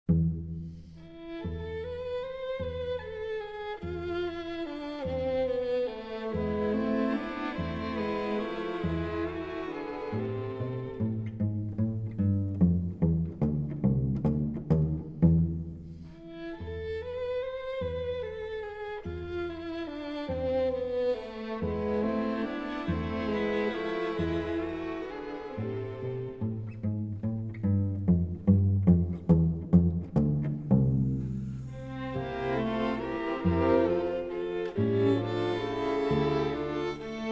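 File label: String Quartet in C major; Beethoven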